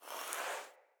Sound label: Staff Caster Charge Heavy Start; Weapons; Player; Staves; Starter